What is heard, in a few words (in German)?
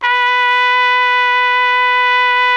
TROMPETE B 2, TRUMPET; Best Service; World Colours